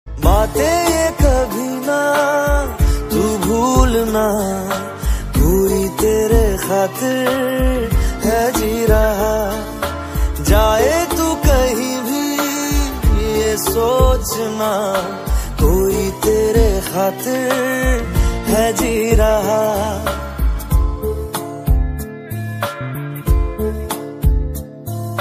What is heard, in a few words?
bollywood ringtone